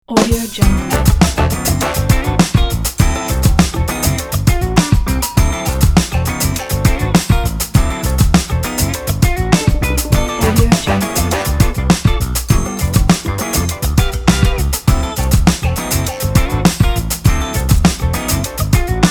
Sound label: sassy groove; akne music